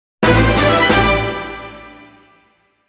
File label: Fanfare, dungeon attack; fire emblem echoes shadows of valentia original soundtrack